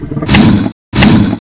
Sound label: zbs attack bite; cstrike; dione